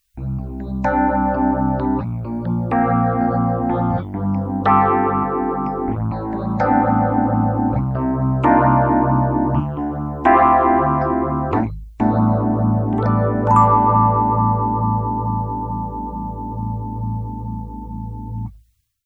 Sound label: comphasing; Netzbetrieb; EHX Electric Mistress Flanger